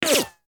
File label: bullet fly by